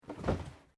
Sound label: Cardboard box place; Material components for constructing more complex sounds; Materials